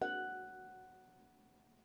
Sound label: f#1, steel, percussion; Giga Samples Collection